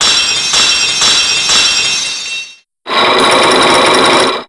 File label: lock end; psyche lock